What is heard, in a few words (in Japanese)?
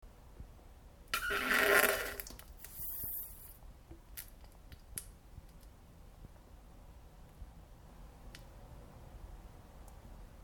なんべんおなら ぶぅーぅ むちゃぷすちゃ音